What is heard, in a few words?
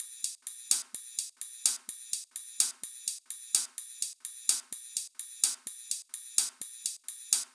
128BPM Heavy Duty Kit